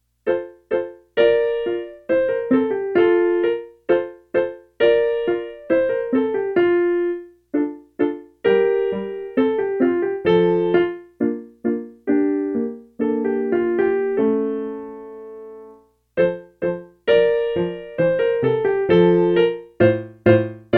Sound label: the marching trumpets